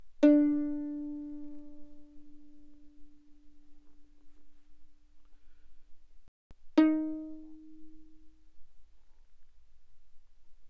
Violin pizz mf sul D D4 B4; Strings; violin2012

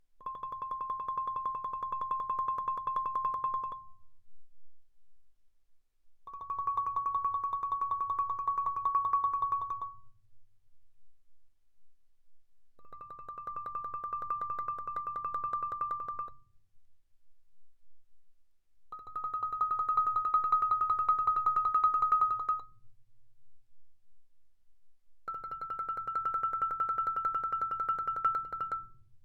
Marimba roll mf C6 B6; Percussion

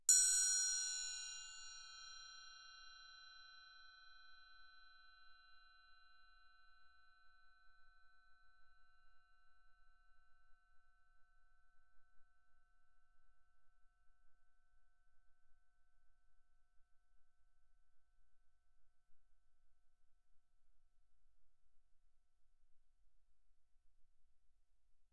8 triangle, ff; Percussion; hand percussion

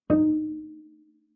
Bass pizz ff sul G E4, Double Bass; MIS Pitches - 2014; Strings

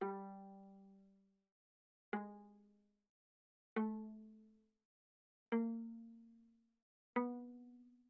Viola pizz sul G ff G3 B3

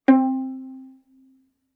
Violin pizz ff sul G C4; Strings

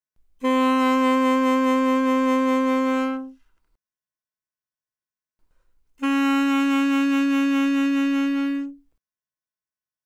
Alto Sax Vib ff C4 B4, alto saxophone

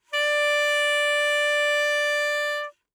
Alto Sax No Vib ff D5, Eb Alto Saxophone; Woodwinds; MIS Pitches - 2014

